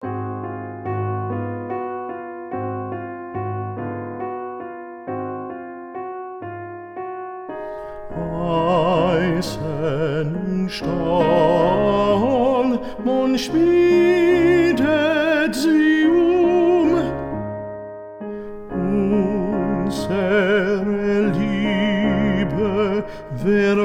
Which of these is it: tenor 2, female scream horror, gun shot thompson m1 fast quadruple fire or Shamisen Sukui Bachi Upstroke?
tenor 2